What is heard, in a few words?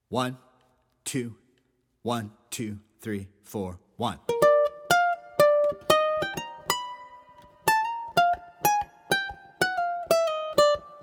steeplechase, uke